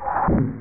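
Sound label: door close; ReaderGames; TankedUp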